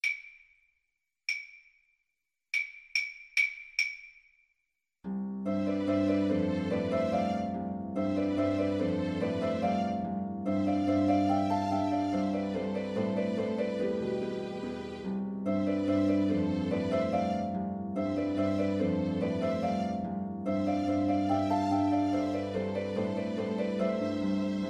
lakme, Cello

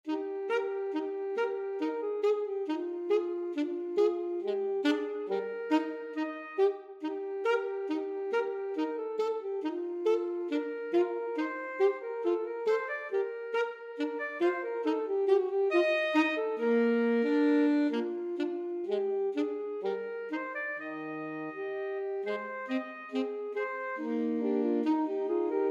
alto sax trio

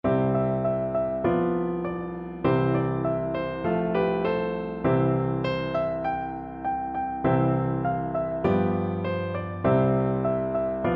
waltzing matilda, piano duet

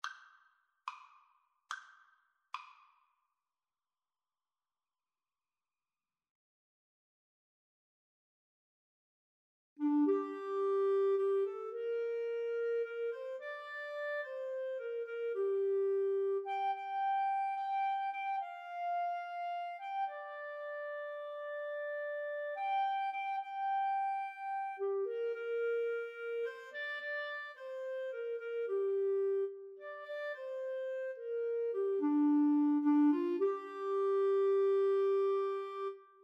Clarinet Duet

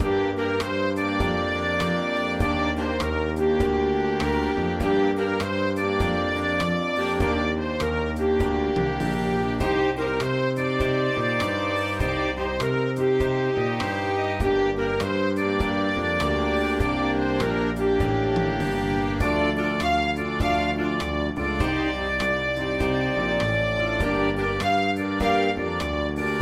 band, mixed ensemble

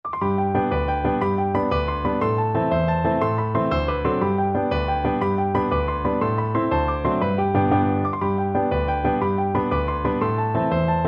irish jig medley 2 piano

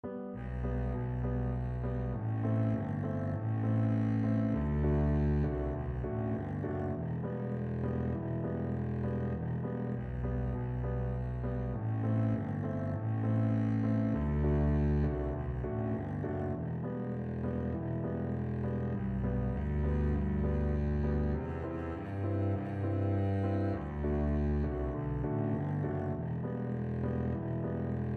horses, double bass

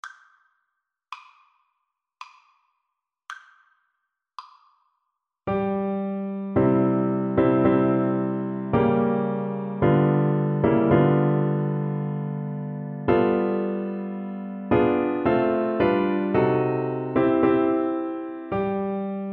pilipinas kong mahal, Cello

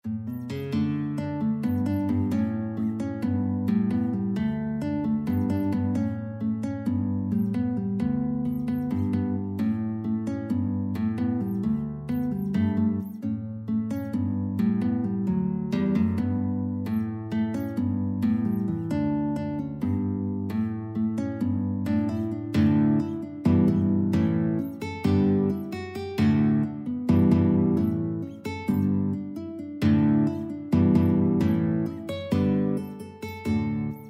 guitar

